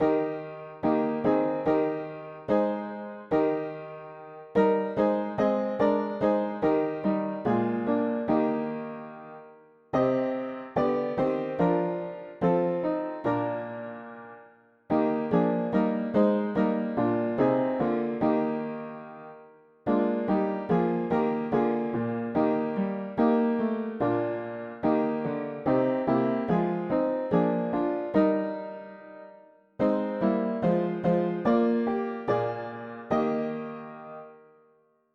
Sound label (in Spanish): mono piano; hymns; Himnario pequeno